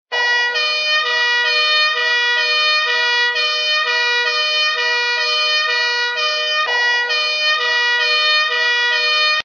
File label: Ambulance